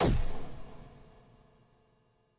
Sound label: eye open